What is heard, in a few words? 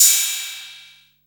808 CYM, TR-808